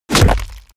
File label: club impact crack wet